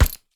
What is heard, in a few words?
Punching Percussion, Straight Glove (Chain), raw; 99Sounds